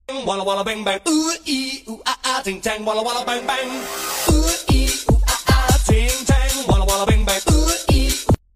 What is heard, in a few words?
oo ioo a a